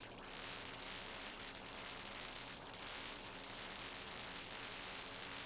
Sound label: whitened bubbling